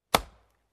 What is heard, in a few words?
Single Wood Chop